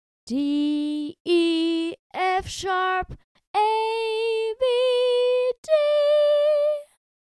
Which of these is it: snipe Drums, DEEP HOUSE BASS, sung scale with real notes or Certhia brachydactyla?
sung scale with real notes